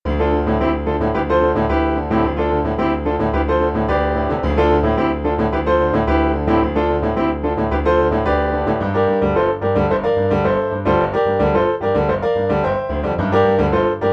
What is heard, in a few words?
piano